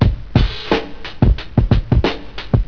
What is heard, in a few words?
drum